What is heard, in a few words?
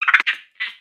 Pets Skunk Speak; creatures; Lego